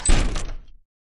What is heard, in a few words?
Door Close; Lethal Company